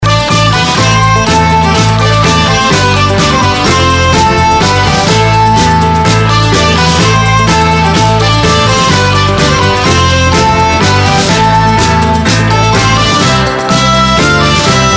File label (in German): Tormusik